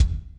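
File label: soft bass drum; osu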